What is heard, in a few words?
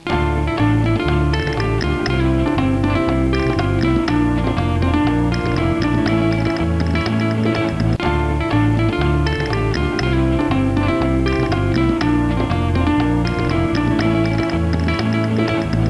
mexico; de hacienda